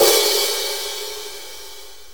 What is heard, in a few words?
HiHat, Blues, One Shots; Sonic Foundry; Drum Tools